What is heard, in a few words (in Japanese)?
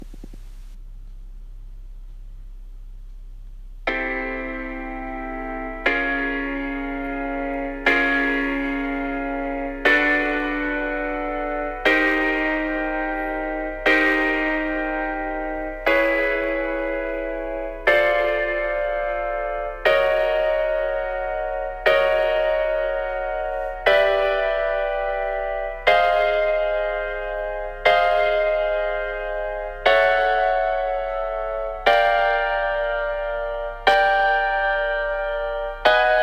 メジャーシックスコード音源